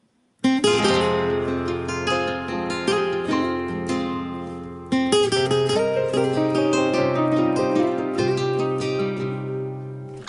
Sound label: Guitar